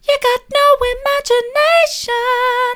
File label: you've got no imagination soft, vocal